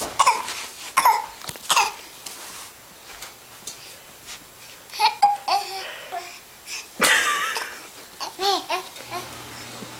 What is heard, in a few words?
baby coughing